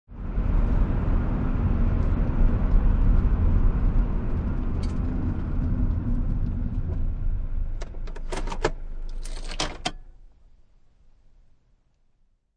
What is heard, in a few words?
Car Interior Drive Stop Engine Turn Off, Car Interior, 1996 Acura Integra, Drive Up, Stop, Turn Engine Off; Transportation Automobiles